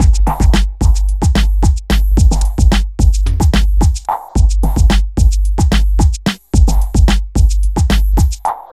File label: machine madness 110bpm, downbeat dance grooves